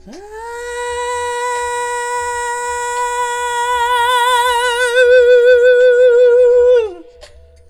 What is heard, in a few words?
FEMALE, Hip Hop